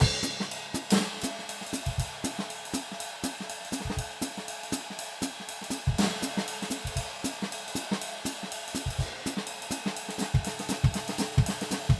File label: 120 Trad Jazz Drums; Pro Tools Essential Loops; Digidesign